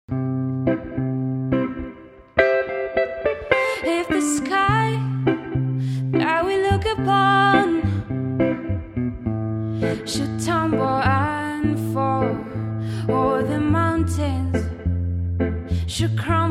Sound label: acoustic